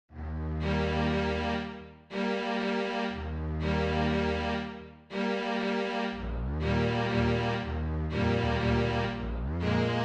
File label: Quartet for Low Strings - 4 basses MIDI; Saint Saens; L'Elephant